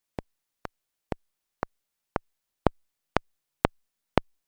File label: Short Attack